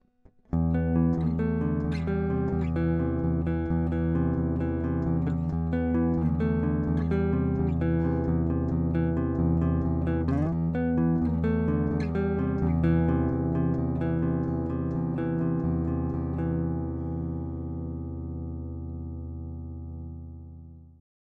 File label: harley benton te-90flt neck clean picking